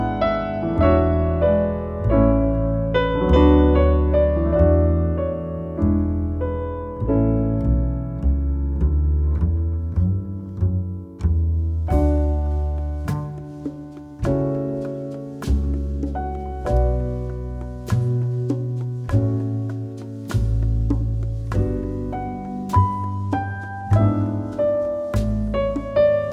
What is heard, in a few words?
Percussion; Cuba Percussion